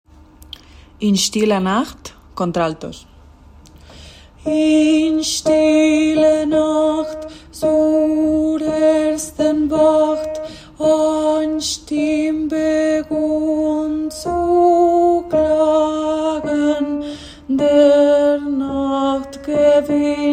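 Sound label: stiller nacht alto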